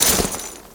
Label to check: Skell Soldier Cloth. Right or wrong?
Right